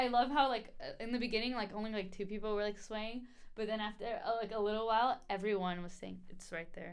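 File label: vocals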